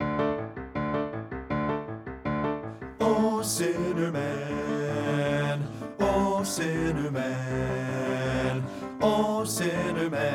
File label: BASS; men